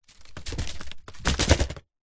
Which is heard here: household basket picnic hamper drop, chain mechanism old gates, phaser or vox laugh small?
household basket picnic hamper drop